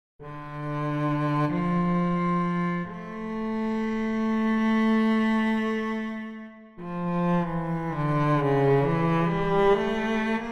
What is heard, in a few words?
cello; pocketBlakus